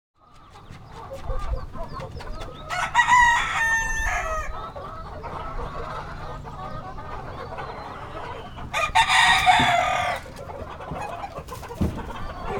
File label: Village rooster crowing distant perspective